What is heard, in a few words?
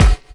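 snare drum bass drum, Dance Mega Drums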